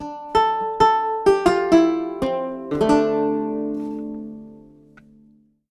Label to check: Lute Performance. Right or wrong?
Right